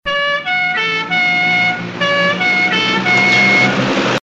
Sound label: old siren and acceleration; car